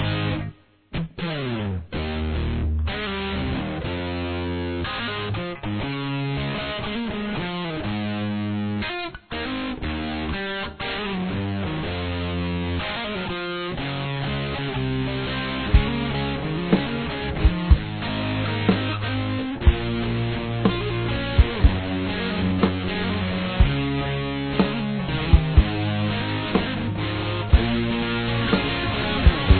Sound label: verse chorus, guitar